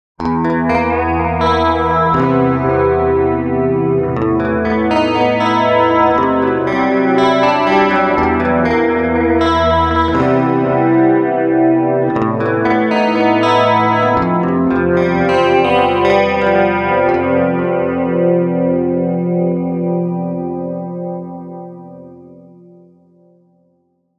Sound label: Clean; AmpliTube Joe Satriani Boston 100